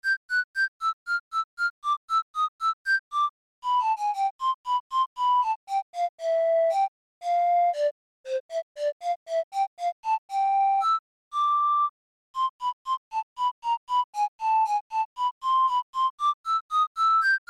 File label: Louis Flute; Harvest Moon Save The Homeland